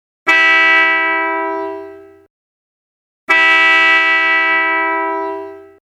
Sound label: dolce echo